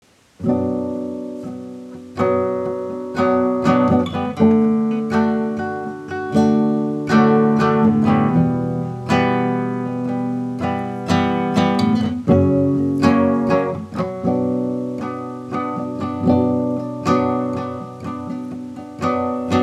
guitar

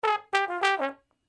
brass loop

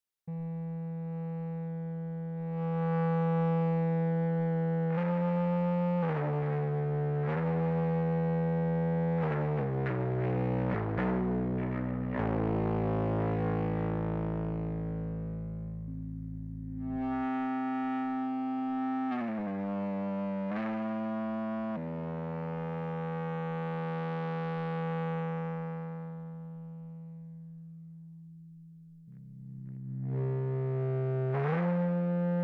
Ebow Ensemble; Mille Regretz

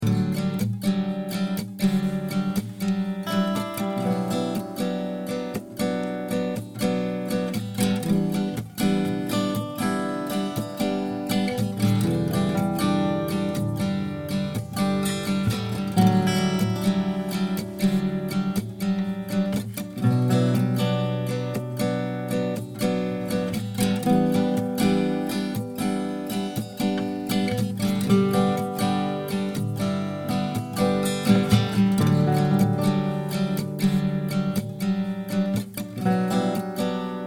D120 Strummy Chords Loop

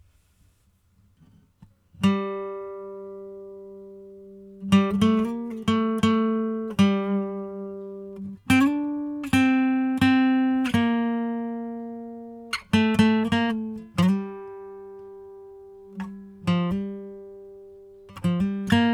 acoustic guitar

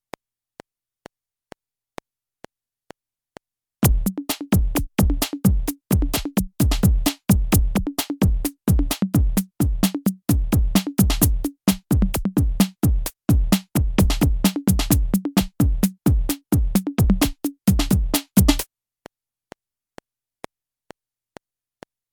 dirty beats loop

